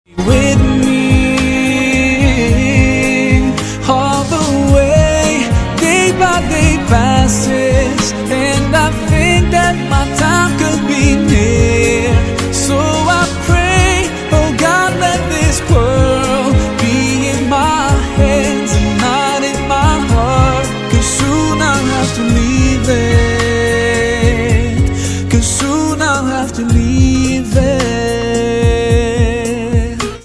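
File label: english islamic ringtone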